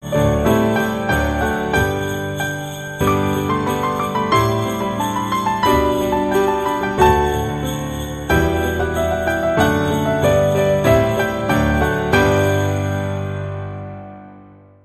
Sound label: Ding Dong Merrily On High